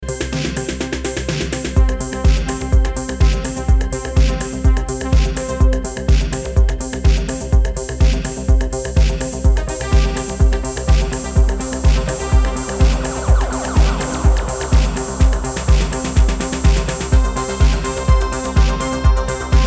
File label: Arpeggiophobia